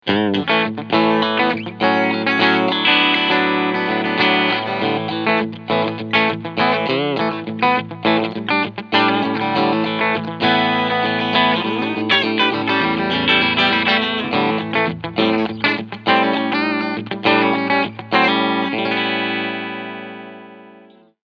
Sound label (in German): Fender Player Series Strat Cleansound Steg Pickup